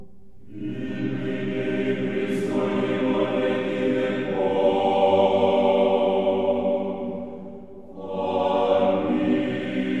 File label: O thou Th Only Beggoten Son, Choir; Kiev Theological Academy and Seminary Choir; Canti religiosi tradizionali di Kiev